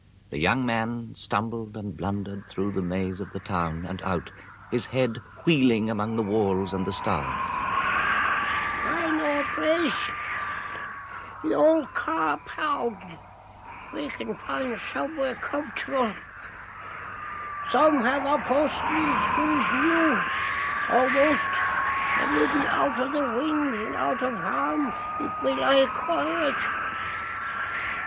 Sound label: Wind and siren, traffic mix, chanting; The Man Who Collected Sounds